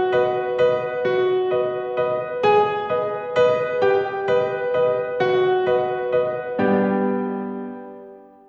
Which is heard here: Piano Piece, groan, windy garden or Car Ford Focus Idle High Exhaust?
Piano Piece